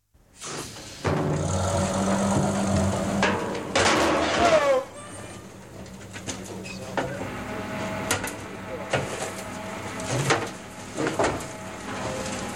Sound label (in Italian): CAMION DELLA NETTEZZA URBANA